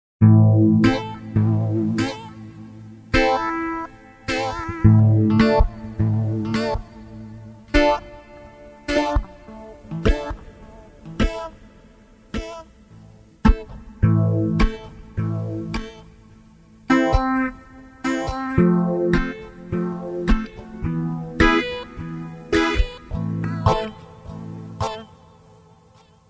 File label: reggae skank and chop